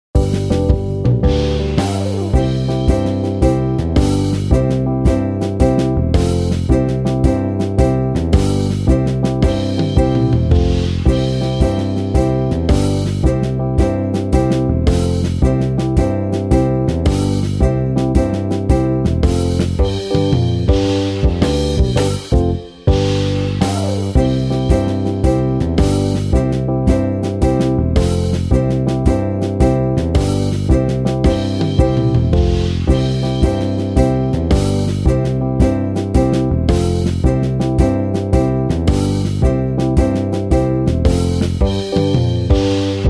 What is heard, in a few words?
ukulele jam track; fun music company